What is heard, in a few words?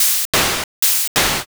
breathing